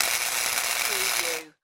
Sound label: Arc Welder